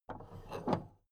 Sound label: big plastic case handle take; objekte; kiste